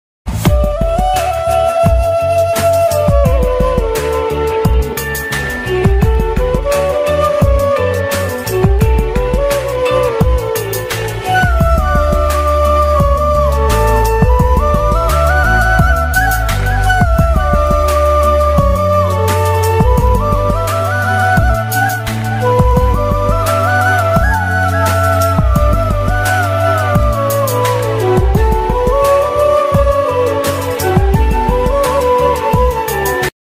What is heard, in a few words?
song bgm ringtone song instrumental ringtone